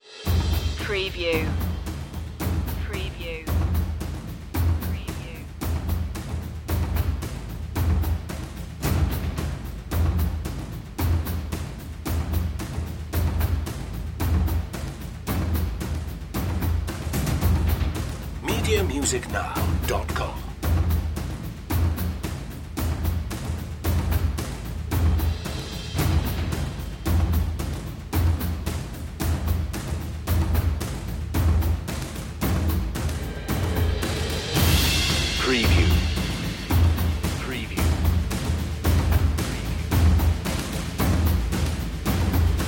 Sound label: perc mix